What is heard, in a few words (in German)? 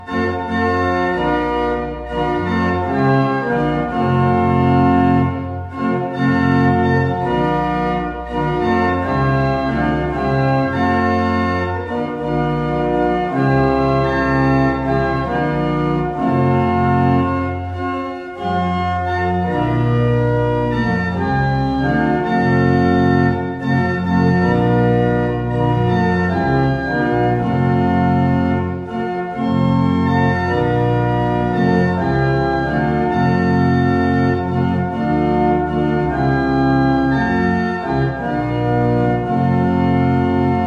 Orgel; Weissenfels Ladegast Orgel; denkmaltag